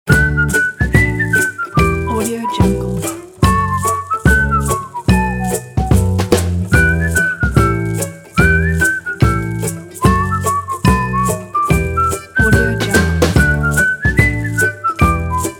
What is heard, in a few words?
a quiet town street ident